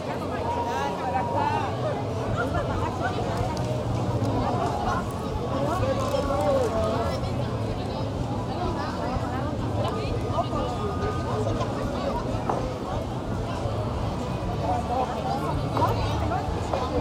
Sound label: crowd; Golden Crayon Sounds